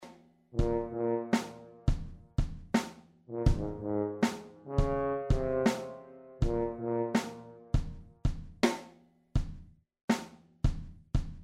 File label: tuba